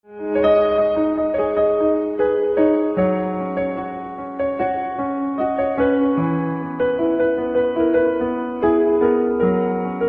Piano Silent

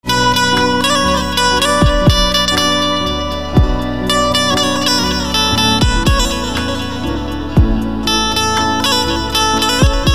Banjo

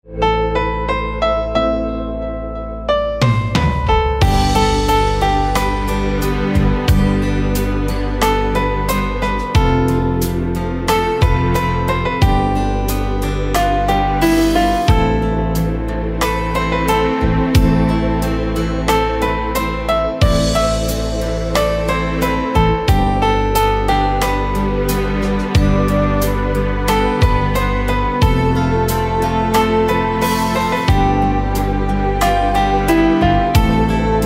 Piano

